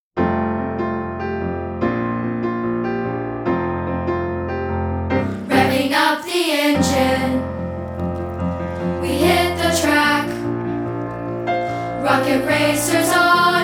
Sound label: Piano Vocals